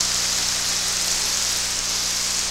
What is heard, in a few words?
FX, Futurist Drum & Bass; Sonic Foundry (Sony Creative Software)